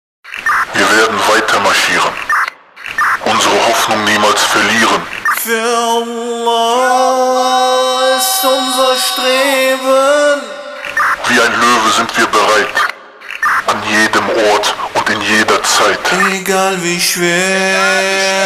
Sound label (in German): durch berg und tahl vocal; army of mahdi